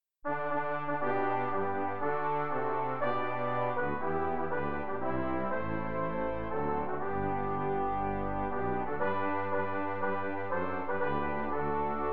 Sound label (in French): hymne national francais pour piano; rouget lisle claude joseph; france